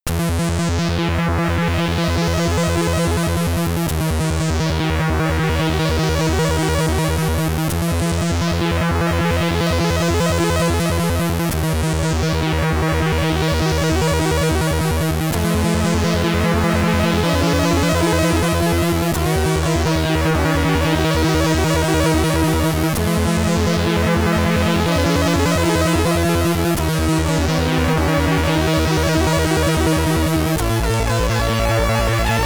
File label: SID Stereo; brain artifice; commodore 64; Game Over